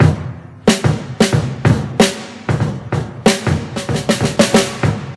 93 bpm drum loop d sharp key, Free breakbeat - kick tuned to the D# note.